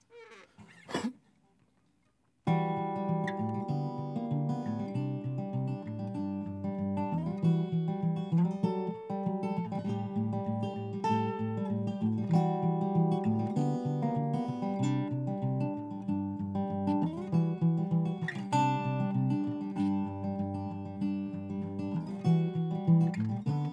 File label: instrumental